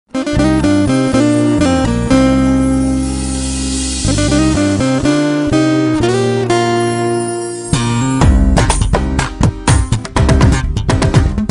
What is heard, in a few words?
Serial Song; Aval